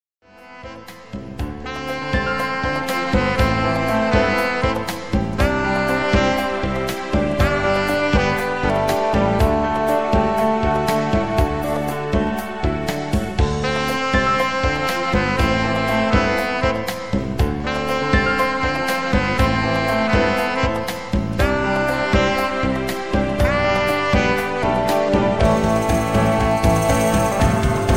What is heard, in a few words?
sax; contemporary ballads